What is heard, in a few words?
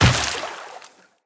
Run Water, Footsteps; Tenno